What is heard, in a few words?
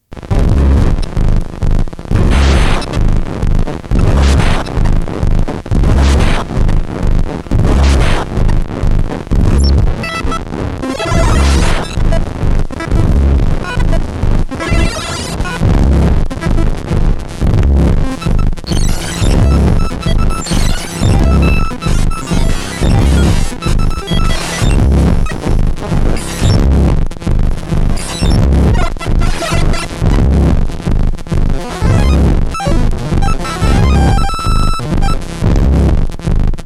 1bit groove; Gijs Gieskes